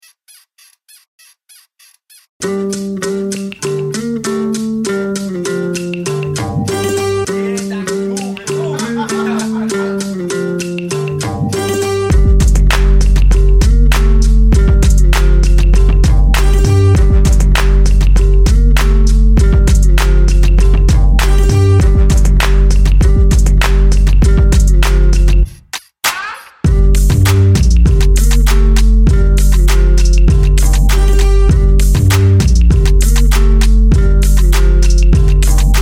Shake 99BPM

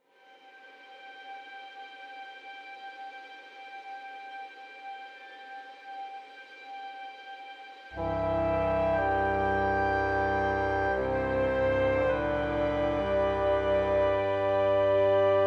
Happy Birthday Arrangement

